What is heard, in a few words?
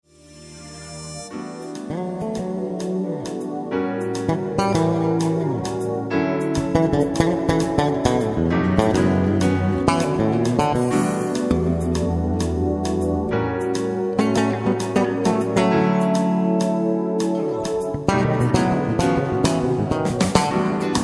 turn around bass; instrumentalist